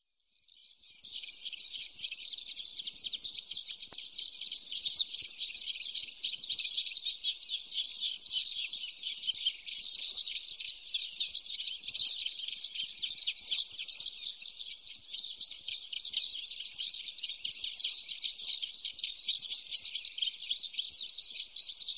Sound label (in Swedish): Gularla, Faglar